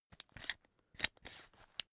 umbrella telescopic retract slow

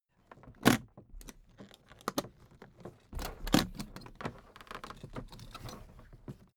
suitcase handling

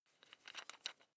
electric drill bit unfasten wrench turn

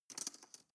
cutlery teaspoon drawer place soft

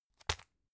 tissue package drop soft

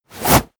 wipe

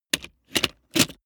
plastic mechanical handling lock

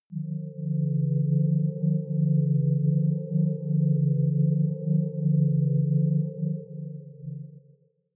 ufo fly steady smooth

